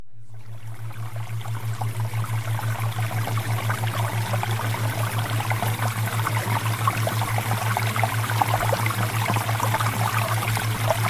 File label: Water Theta Waves; Premium Subliminals